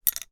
office paintbrush small artist wooden drop onto others